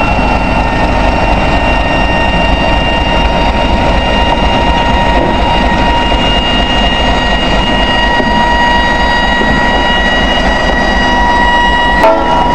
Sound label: turbo